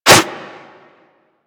Whoosh, Weapons; Warframe; Furax

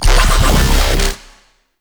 Infested Shotgun Growl; Warframe; Weapons; Infested Mercy Shotgun

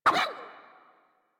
Puppy Idle Speak Excited; Enemies; Kubrow; Warframe

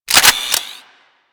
Hand Rocket Launcher Reload A; Crp Hand Rocket Launcher

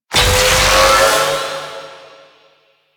Melee Charge; Warframe; Necramech

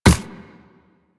Run Ice, Footsteps; Warframe; Operator

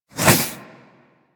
Warfan Whoosh; InfWarfan; Warframe; Weapons; Melee

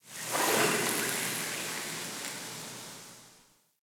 Galleon Pipe Sizzle Amb; Ambience; Warframe; Grineer Galleon

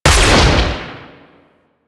Shot, Weapons; Warframe; Tenno; Magnus Prime

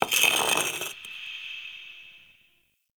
Stone Small Scratches; Ambience

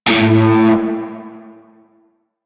Shawzin Oct One A; Music; Warframe; Narmer Shawzin

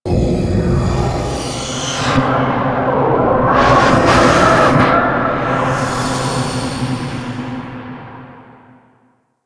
Breathy Vocal; Ambience; Man In The Wall

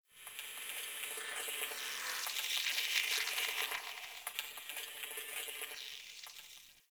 Venus Solaris United Data Texture, Ambience; Warframe; Venus Open World

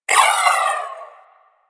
Briefcase Akimbo Pistol Alt Proj Whizzby; Warframe; Weapons; Corpus

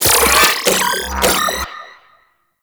reload; Warframe; Weapons; Cephalon; Ceph Primary

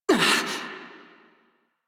Pain, Female; Railjack Crew; New Loka

